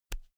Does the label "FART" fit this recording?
No